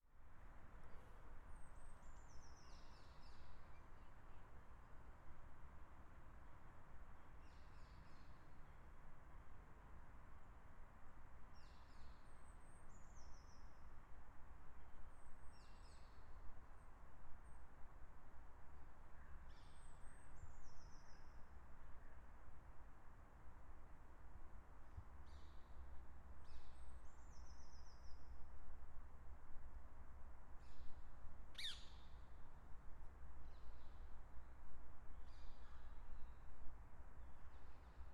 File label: Birds Forest